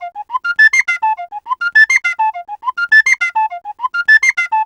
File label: Penny 103bpm F, Penny Whistle; African; Quazibeat; African Secret